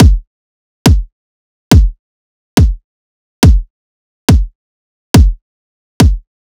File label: Riddim Drum Loop 140 Kick